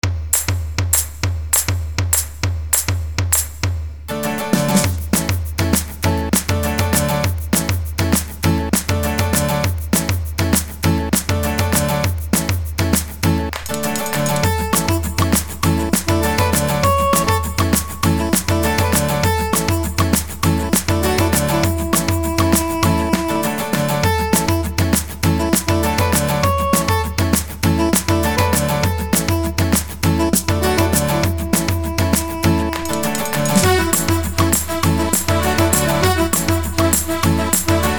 Happy Energetic Acoustic

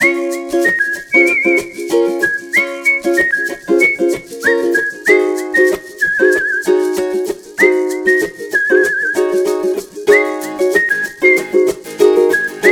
Ukulele loop; Corporate Ukulele

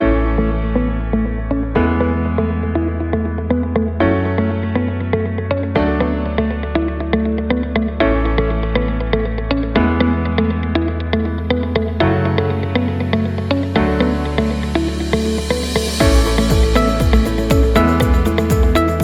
business background music, corporate promo music

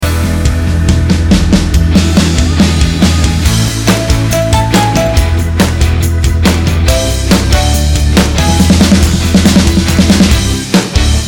fun tv show intro logo music short

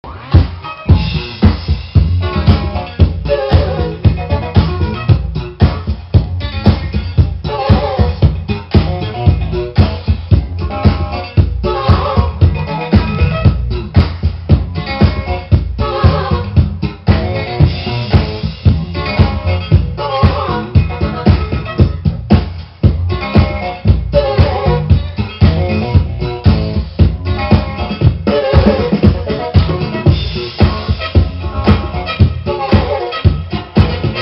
Funk

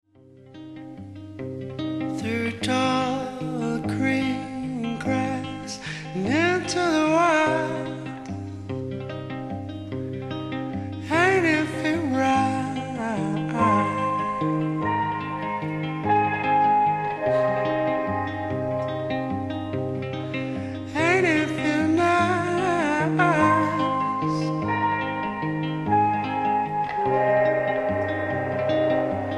PIANO AUDIO

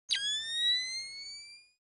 night vision goggles sound effect; splinter cell

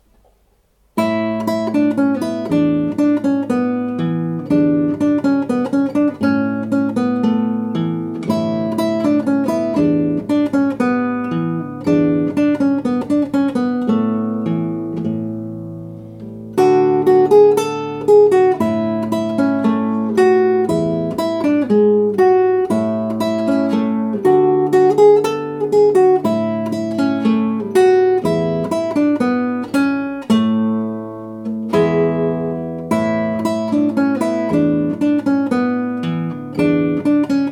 Samba Guitare

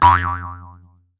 Boing Boing cartoon

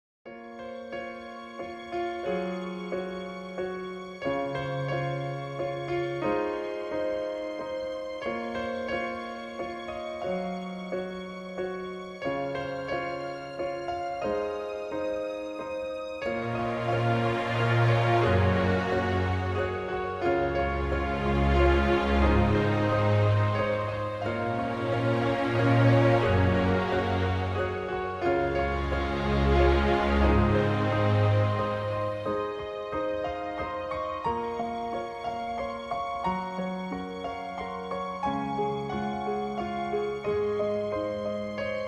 relaxing music mix beautiful piano

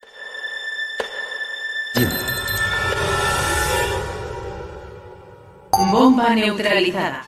Boom; Bombaneutralizada

